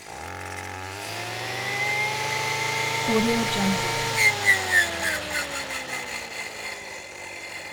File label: strimmer on axis; soundbits-soundfx